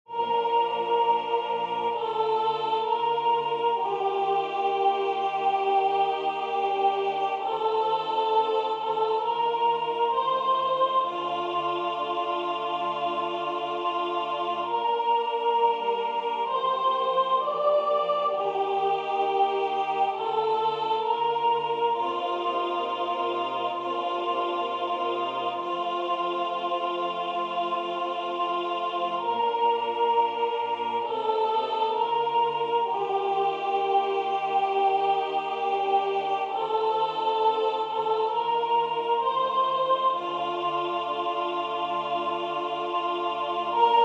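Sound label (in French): soprane, Musique sacrée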